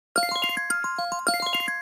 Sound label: iPhone 5 Notification Ringtone